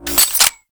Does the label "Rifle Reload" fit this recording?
Yes